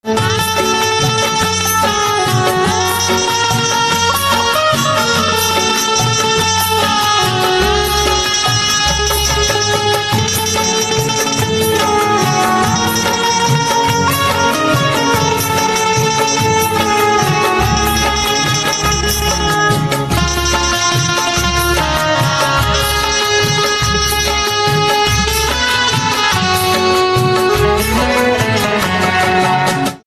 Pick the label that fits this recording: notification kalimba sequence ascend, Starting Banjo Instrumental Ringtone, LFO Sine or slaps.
Starting Banjo Instrumental Ringtone